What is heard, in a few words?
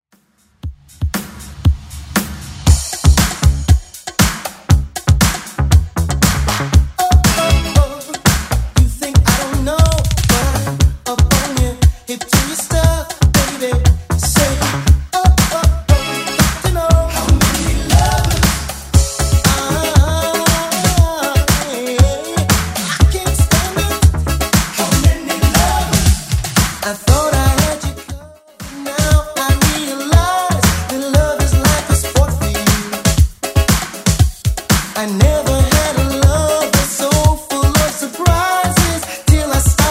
80s Redrum 118bpm